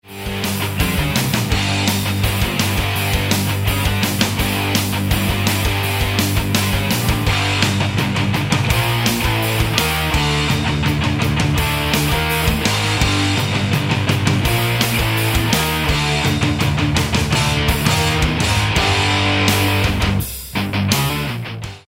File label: metal